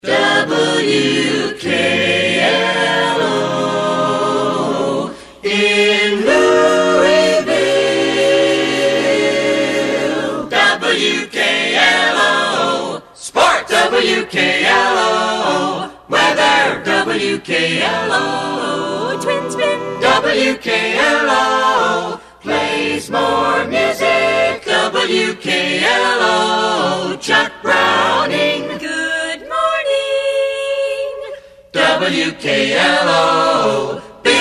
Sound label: 60s Acapellas; WKLO